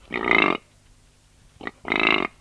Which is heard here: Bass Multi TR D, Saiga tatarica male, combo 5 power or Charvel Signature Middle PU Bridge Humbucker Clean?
Saiga tatarica male